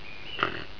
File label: grunt